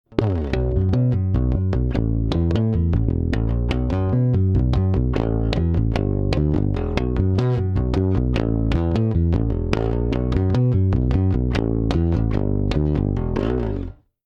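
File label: Rickenbacker EBS Comp ON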